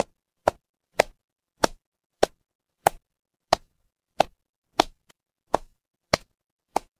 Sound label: footsteps concrete